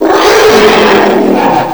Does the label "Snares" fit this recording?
No